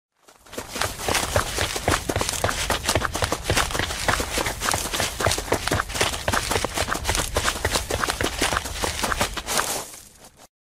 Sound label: Group Running